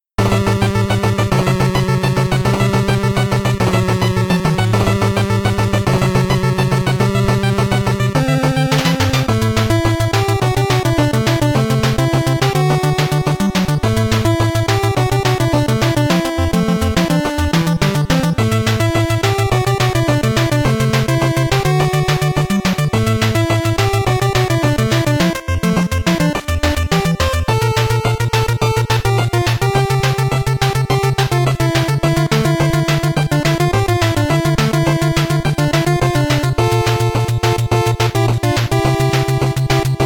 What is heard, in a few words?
BGM LAST BOSS INTRO 8bit; Mighty No. 9; 8-bit soundtrack